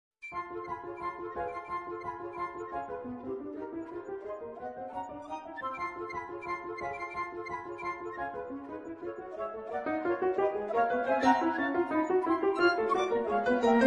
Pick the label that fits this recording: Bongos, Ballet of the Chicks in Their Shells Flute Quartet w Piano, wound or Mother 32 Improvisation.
Ballet of the Chicks in Their Shells Flute Quartet w Piano